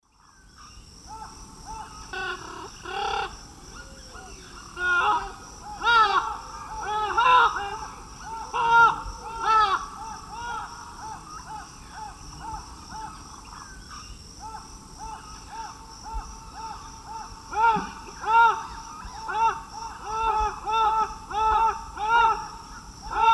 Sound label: Amazona festiva, calls 2 pairs; S. America; Eastern Lowlands